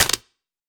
红树根踩踏声

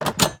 铁门打开声

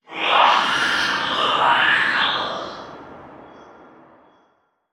幽匿尖啸体尖啸声

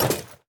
装备下界合金盔甲声

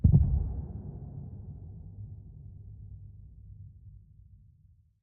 监守者附近关闭声

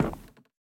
錾制书架踩踏声

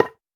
骨块破坏声

